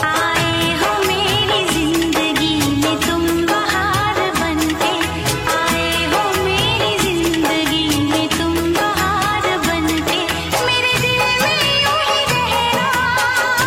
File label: Old Hindi Ringtone